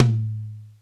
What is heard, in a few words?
Alesis Rack Tom; hr16 stereo